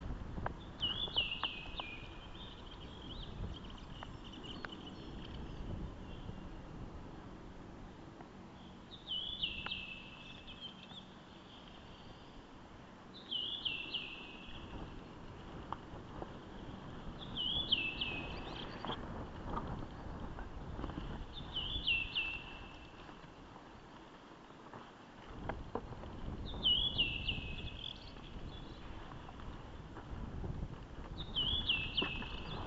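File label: Naumann's thrush voice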